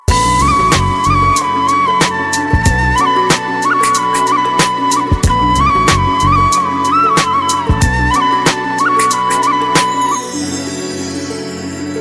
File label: Bansuri